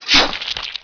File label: Warrior Sword Slice; Playable Tutorial Level; TR2